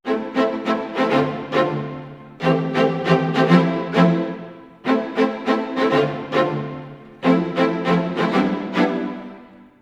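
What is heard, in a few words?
100 strings, A minor, Orchestral arrangements; PowerFX - Downtown Orchestra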